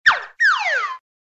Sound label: sms effect